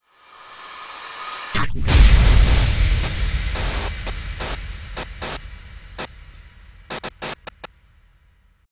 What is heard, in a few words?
Music Unlock Tap; d4dj groovy mix; android